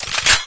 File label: Weapons Rocket Launcher